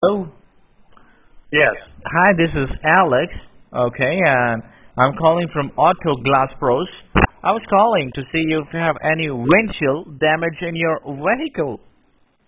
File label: Windshield